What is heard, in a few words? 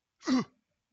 bump; GameplaySequenceDemo